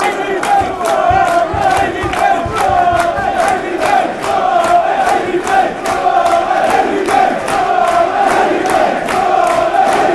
blue and white army, fan chants

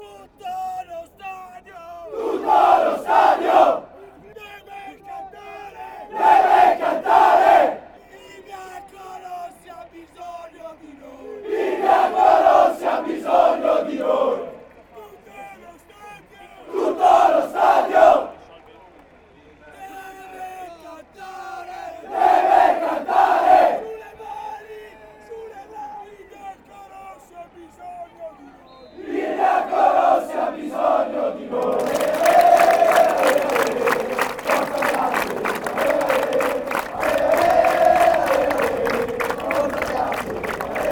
stadium, fan chants

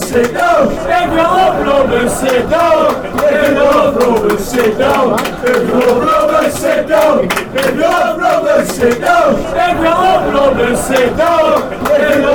sit down if you love rovers, fan chants

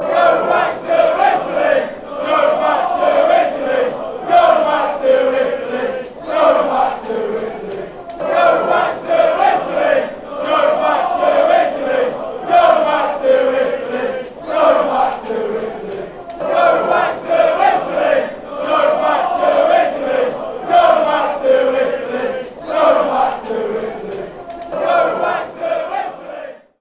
go back italy, fan chants

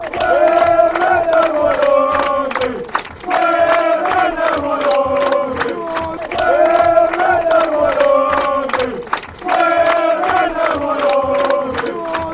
red and white army, fan chants; stevenage

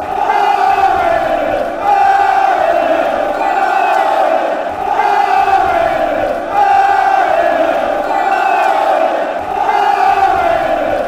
bow legged, fan chants

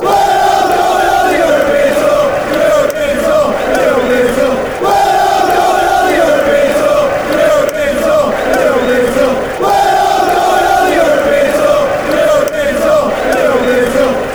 were all going european tour, fan chants

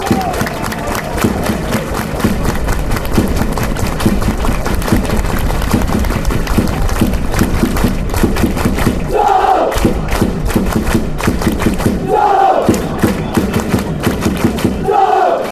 clap, chants; darlington

